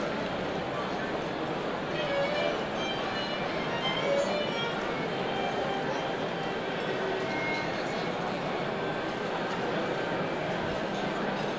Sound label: dinner party, Dinner Party Amb; E-MU Producer Series Vol. 3 – Hollywood Sound Effects; Ambient Sounds